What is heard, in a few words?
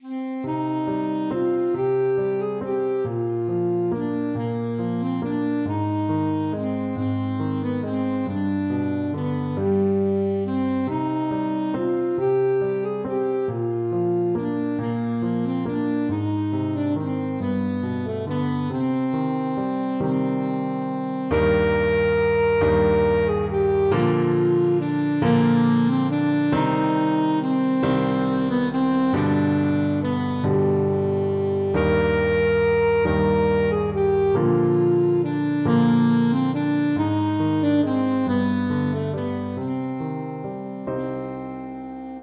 saxophone; greensleves